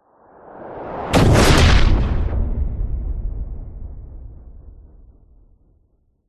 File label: science fiction impact explosion spacecraft blow up missile; zapsplat